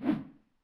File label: Imp Attack Whoosh, imp fireball; DoomRTX